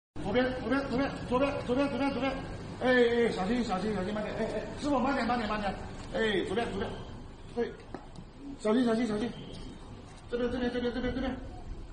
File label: laugh; breadradio